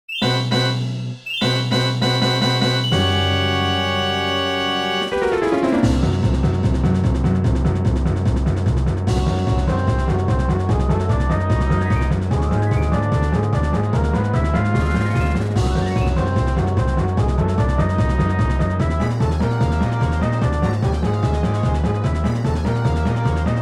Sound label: Boss Battle; Dinosaur; Ocarina of Time Official Soundtrack